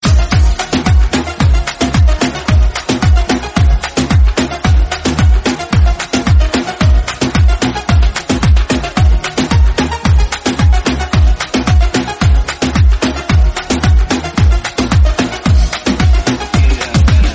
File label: reggae ring